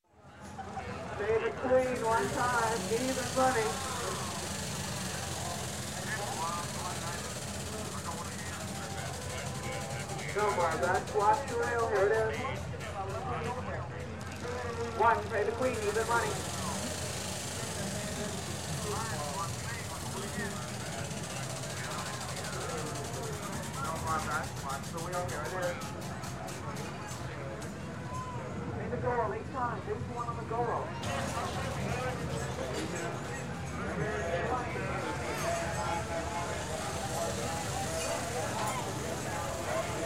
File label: Fair, Amusement Park Games And Barkers, Gamblin; Sound Ideas 2000 Series